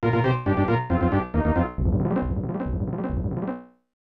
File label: Complete; Hiryu no Ken S Golden Fighter; SNES